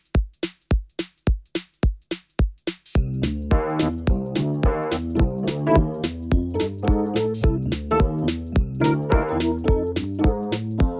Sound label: Blues